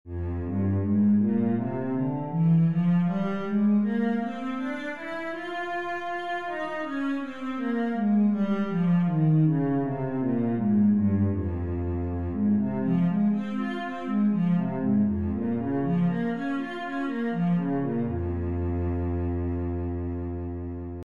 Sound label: cello, f minor